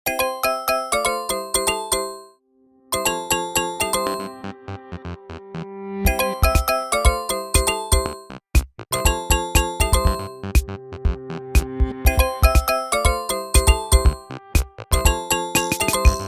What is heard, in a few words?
Kalimbells; Infinix; XOS 10; ringtones